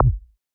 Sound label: Ball Collision Forcefield Extra Light